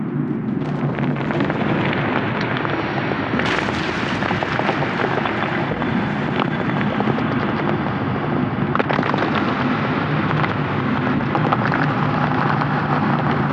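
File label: ambient asteroid fields; Stellaris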